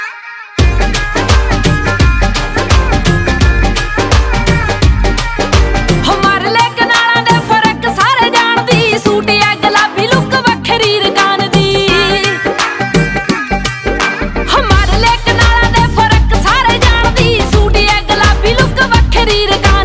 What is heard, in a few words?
Punjabi Song Ringtones